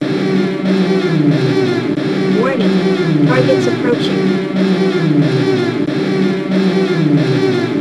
Warning; Night Striker; taito z system; arcade

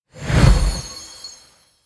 Level up

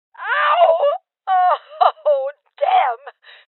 Voices Injury Female Old

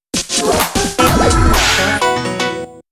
sizzle up - Banner Sound; Radirgy de Gojaru Original Soundtrack

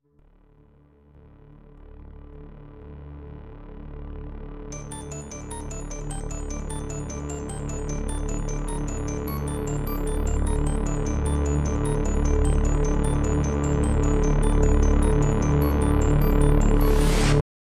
Dark Premonition; Clock Tower The First Fear

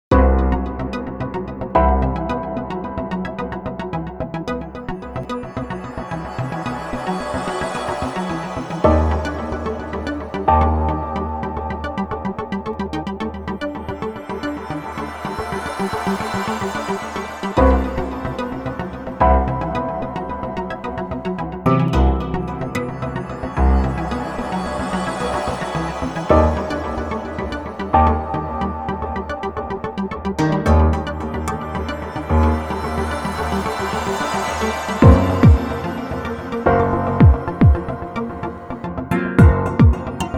Stirring Noise; Koi Labo

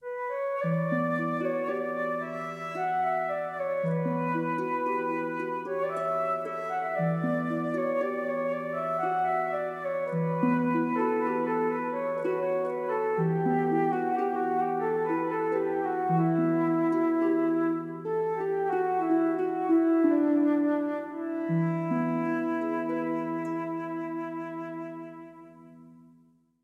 wine full tune; broken sword the sleeping dragon

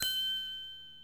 Chime

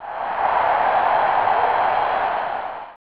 Crowd Cheer; snes; super rbi baseball

